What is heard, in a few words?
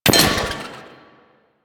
Glyph Target Hit; Warframe; Duviri; Glyph Puzzle